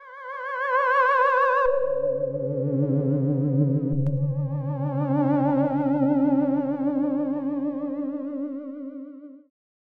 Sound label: ATMO Tormented Ghost; Phasewaver; syntheway